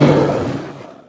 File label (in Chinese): 北极熊死亡声